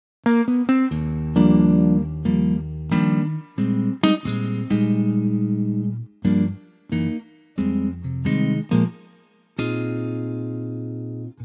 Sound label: Eb sunburst guitar; electric guitar